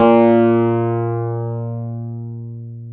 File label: PNO, Wurly